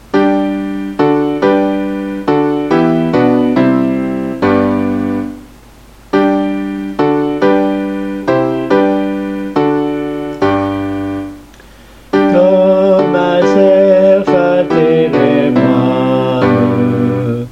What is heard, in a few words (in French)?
Psaume 42 comme un cerf alti et piano; 4 voix